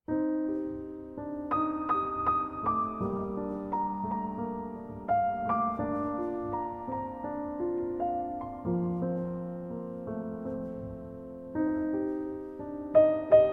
Solo Piano Version

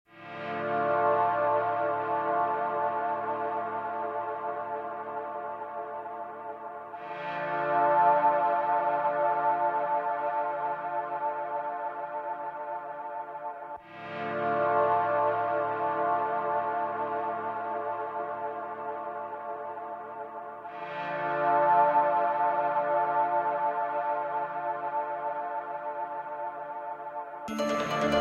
Ambient

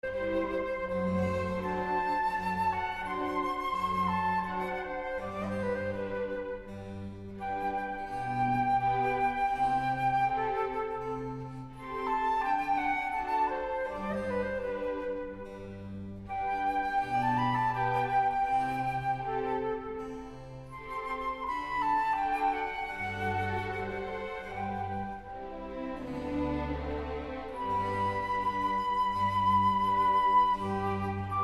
Symphony in D Major, II. Adagio

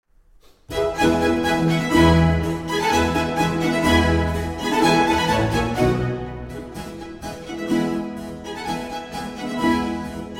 Violin Concerto in E Major, Spring, Allegro

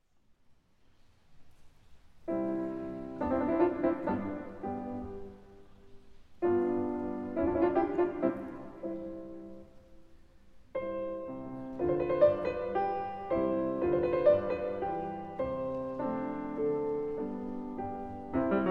Piano Sonata No. 3 in C Major; Op. 2 No. 3; Beethoven

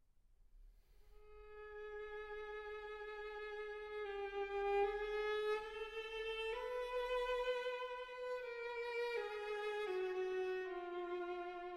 String Quartet No. 6 in D Mesto - Vivace

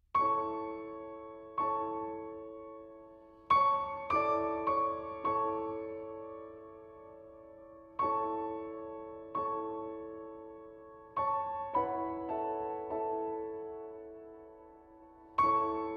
Piano

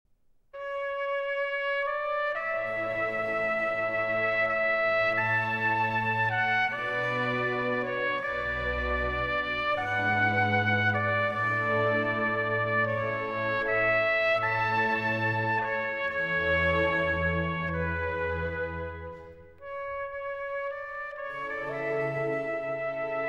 trumpet concerto; Tartini Violin Concerto in E Major, D. 53 II. Adagio (Arr. for Trumpet in D Major)

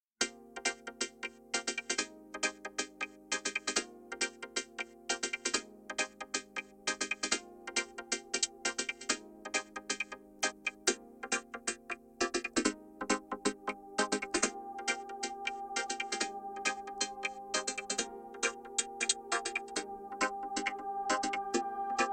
Vocoder

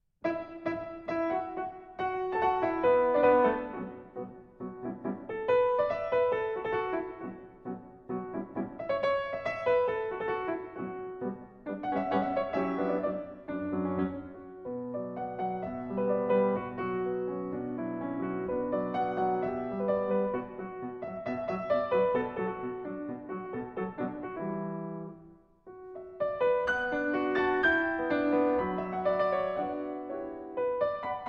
African Pianism Dagarti Work Song; Nketia

